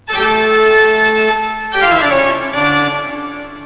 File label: toccata